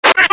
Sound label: chase init; slender